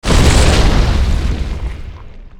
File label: down; gwyn